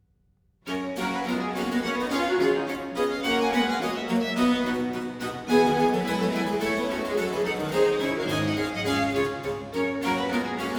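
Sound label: Suite in G major for two violins, viola & basso continuo - Borry; Purcell; Complete Chamber Music; Brilliant Classics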